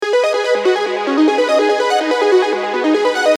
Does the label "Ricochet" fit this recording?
No